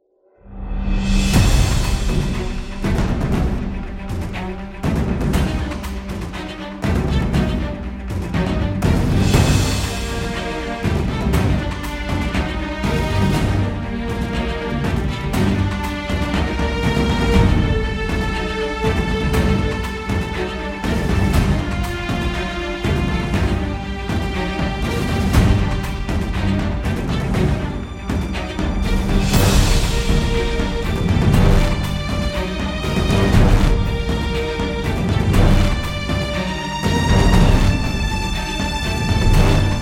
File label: Dramatic Flare